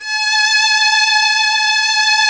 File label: STR LUSH S, MIDI Section